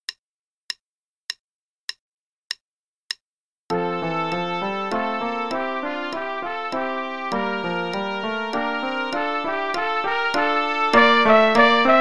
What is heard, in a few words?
Play along, piano, trpt and click, qn=100; Rondeau; Purcell; lessons Trumpet